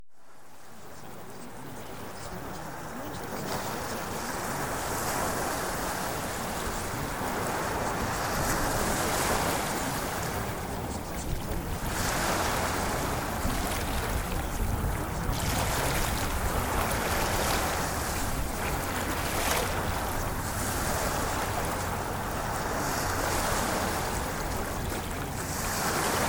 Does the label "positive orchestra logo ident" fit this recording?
No